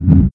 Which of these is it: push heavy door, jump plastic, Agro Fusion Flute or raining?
push heavy door